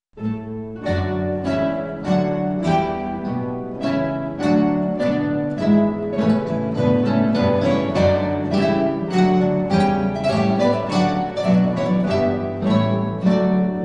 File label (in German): menuett